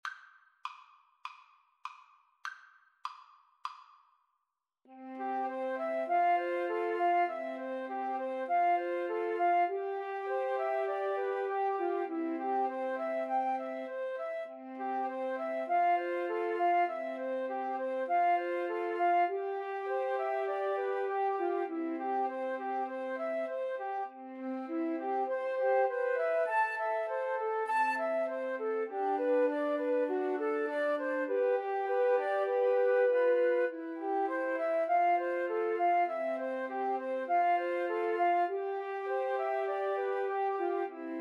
Flute Trio

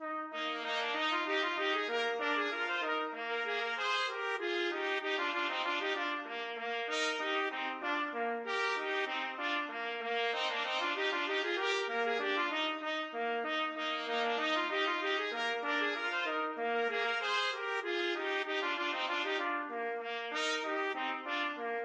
zwiefacher eisenkeilnest trumpet duet

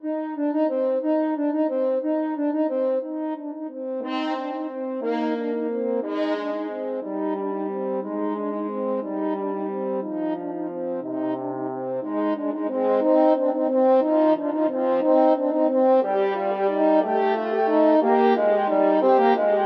french horn duet

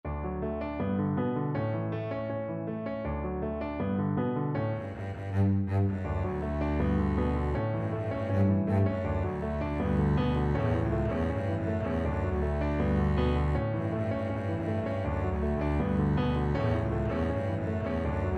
la bamba, Double Bass

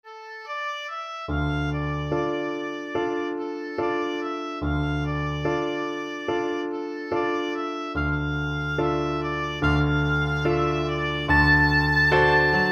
bella ciao, oboe

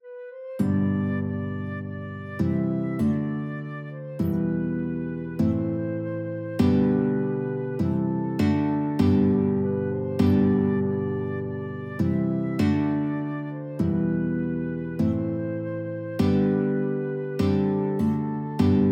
flute guitar, guitar flute duet

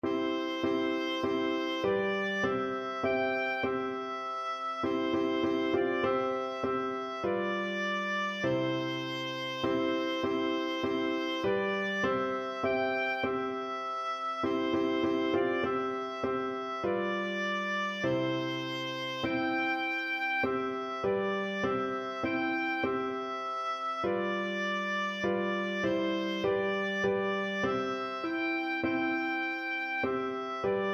blood of jesus, oboe

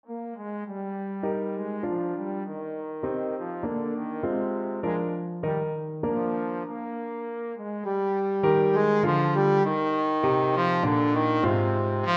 waltzes trombone

